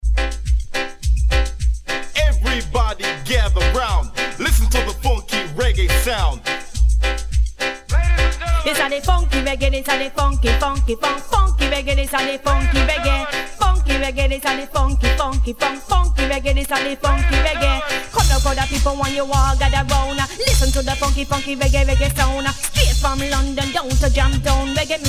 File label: Phunky Reggae